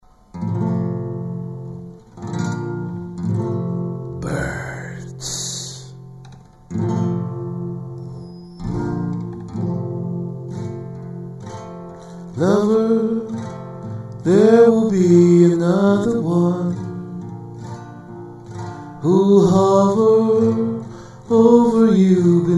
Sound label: birds